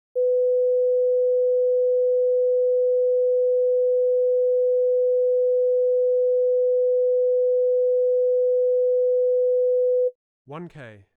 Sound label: Sine and square wave spot frequencies, 500 Hz